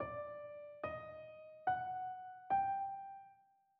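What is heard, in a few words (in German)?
Tetrachord Span1 d auf, spanisch; Tetrachorde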